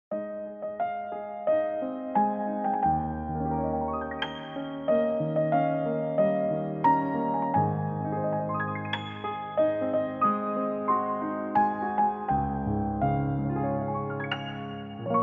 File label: Happy Birthday with Birthday of a King